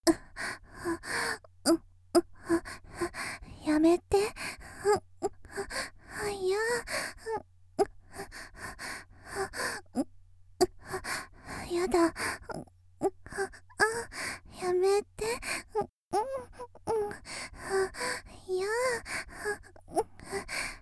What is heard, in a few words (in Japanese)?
「喘ぎ」（だめ、やめて）と言いながら（嬉しそう）; 綾波系; 少女